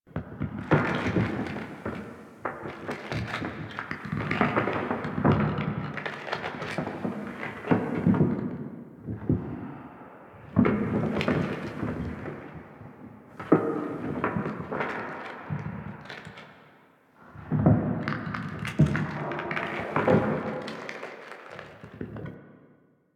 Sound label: bone loop huge; Ambience; Props; Warframe; Infested Eris